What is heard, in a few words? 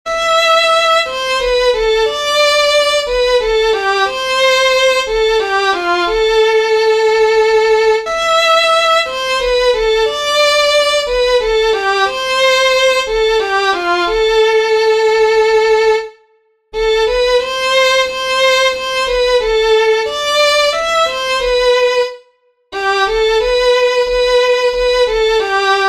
violin